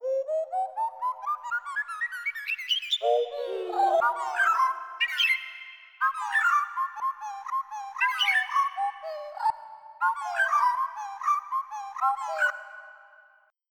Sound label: robin; croc; AMBI BIRD